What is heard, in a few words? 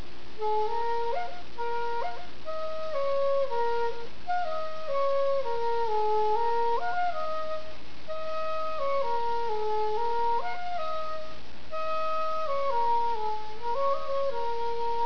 flute song